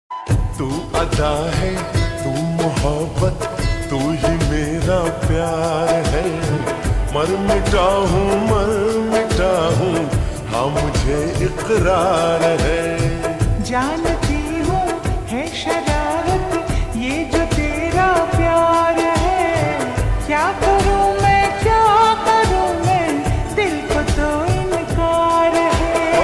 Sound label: Ringtone Slowed Reverb